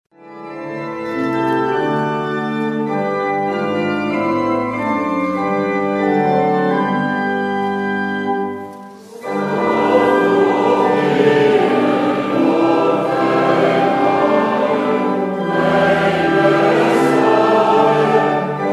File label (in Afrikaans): Gemeentesang